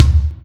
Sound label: kik; drums; virtual drum sounds